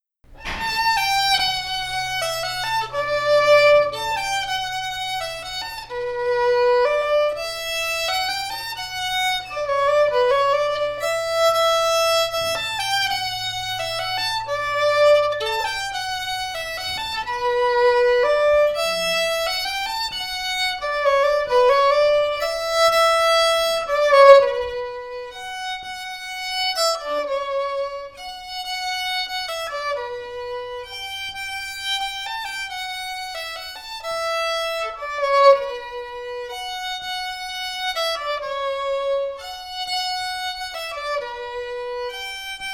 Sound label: Waltz